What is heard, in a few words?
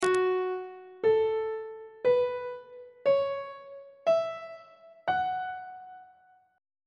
g flat minor pentatonic scale on treble clef